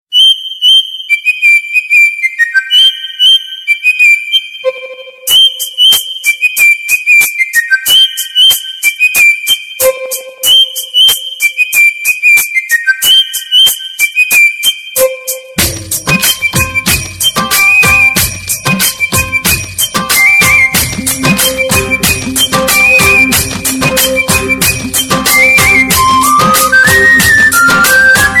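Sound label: loud and original ringtone, eastern ringtone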